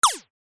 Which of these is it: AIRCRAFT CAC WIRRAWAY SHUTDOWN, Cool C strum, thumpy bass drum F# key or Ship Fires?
Ship Fires